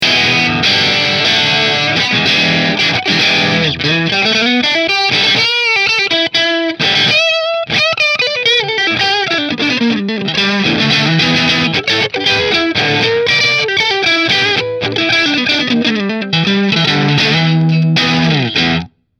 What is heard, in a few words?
Baker Position 3 Marshall, guitar; B3 Metal XS Kerry Green